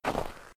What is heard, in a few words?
snow, footsteps; civ13; civ14; Civ Effects